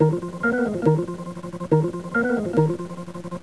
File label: ORGAN